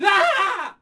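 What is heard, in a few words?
Riflemen Die Vox; Civ4 warlords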